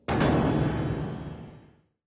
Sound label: freespin reel stop bonus symbol; Dragons Myth